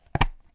button cover; Apotheorasis

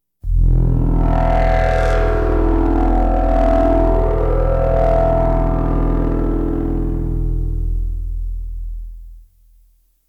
Science Fiction effects, Lasers, Light beams

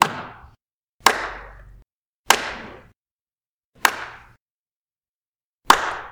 really slow clap; Apotheorasis

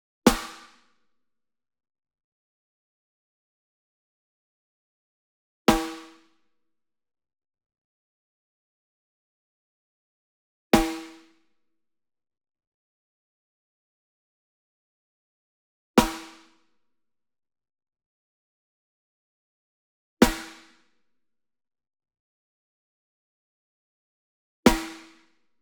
SNARE Jarrah Block Hit Rim Near; SINGLE; Brady; boom library; Cinematic Strikes Construction Kit

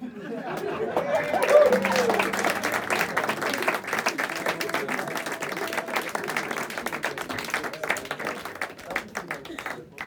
applause laughs; best service Blue Box

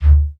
Menu Select

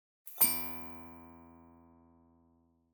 Spring Thin Sci-Fi Button; Hollywood Edge; sound designer's toolkit v2

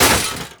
Armour Helmet Hit Shatter; SFX Kit; Creaks, Scrapes, & Misc

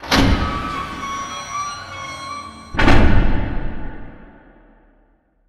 Door Large Metal Open; Doors

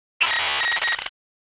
computer bleep; NCH Library

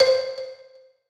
PUZZLE Success Xylophone One Note Wet; stereo; Universal Sound FX

